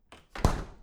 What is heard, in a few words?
Door Fridge Close